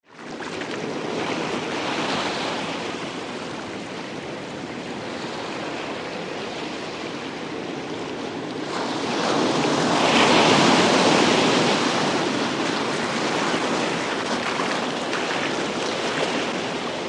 Long Rolling Waves, waves & surf

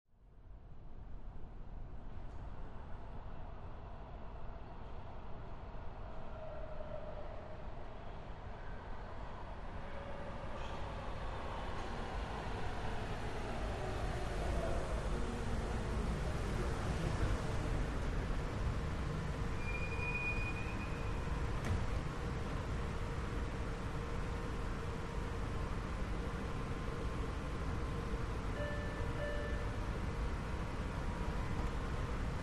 Subway Train From Dis, subway

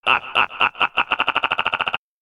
Evil Robot Laughs Wei, servos

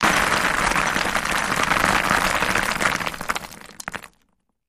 Rock Cinder Block Deb, rocks & dirt

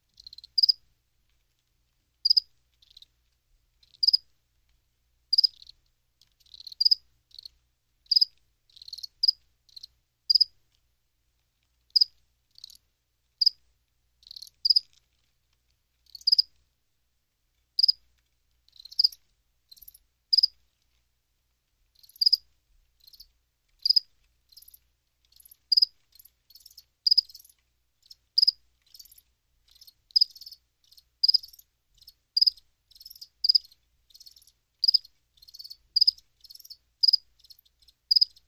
Two Crickets Chirp

